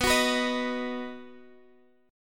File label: Dsharp Minor Sharp 5th